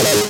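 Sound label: synthesizer Dance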